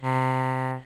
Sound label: Aaaah